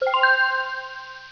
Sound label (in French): Vie Plus